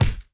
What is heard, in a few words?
counter impact